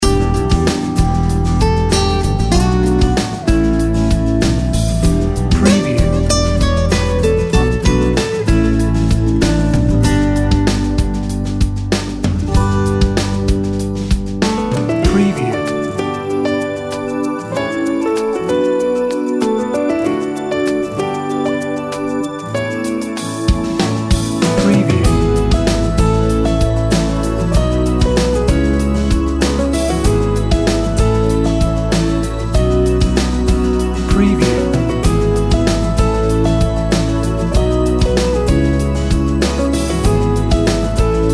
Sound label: Relaxation Pop and RB